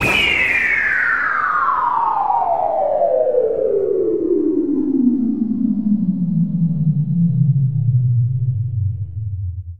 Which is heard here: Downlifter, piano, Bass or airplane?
Downlifter